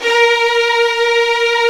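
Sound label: strings, Vlns 8 fff Agitato; Roland L-CD702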